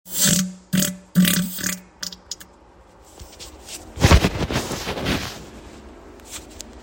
reverb

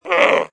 goat hit

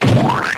splort

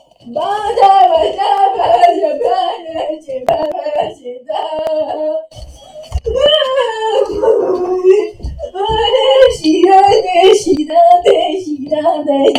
jump sheep

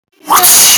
whip sound

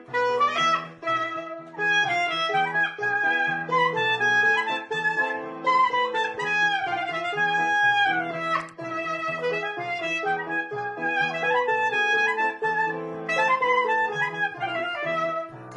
clarinet

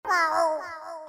meow; family guy; pouncey

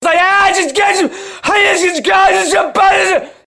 scream

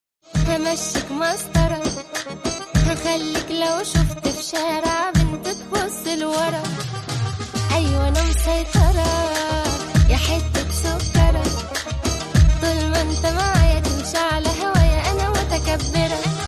aaa arabisch

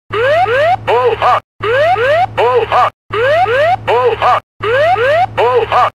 b727 whoop whoop pull up

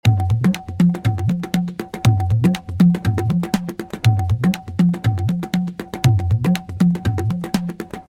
tabla; ms sunshine

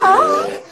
ooooooooh; yoshi npc

